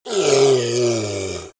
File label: creature ogre grunt short